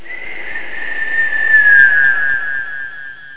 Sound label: ghost; Sword Dream; Nile Trial